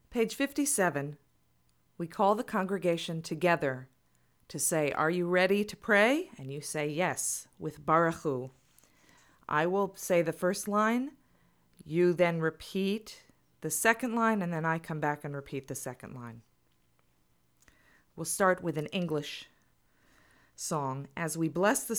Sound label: English Song Barchu